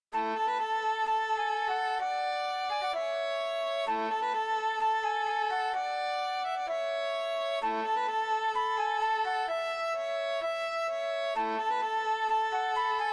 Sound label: brudermars flute violin; myllargutens